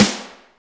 SNR, Tekno Industrial; East West

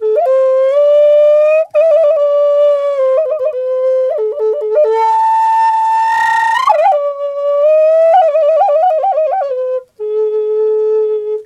A WILD, FLUTE WILD

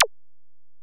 EPerc, Alesis DM-PRO; 300 Drum Machines

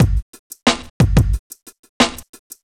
Beat, Hip-Hop

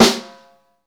SNR, Snare; Club-50 - Foundations Roland; Ext.Snare 2

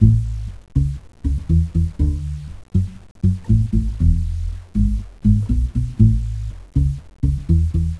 Breezy Bass, Spanish Guitar; Sony Creative Software; Crimson Blue and Fabulous Horncraft 4 RnB; Loop Samples; TotalSpanishGuitar

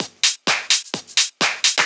Discohouse Loop 128 BPM

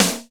snare, hip kits

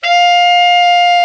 TENOR, Tenor Sax; Tenor Sax 1

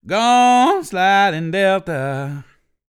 DELTA, BLUES

